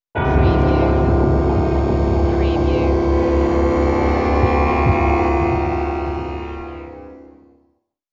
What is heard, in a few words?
Dark Production Stinger